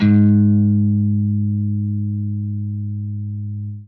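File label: jazz line, guitar; Quantum Leap - Guitar & Bass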